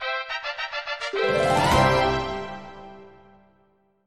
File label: Victory; ycrpg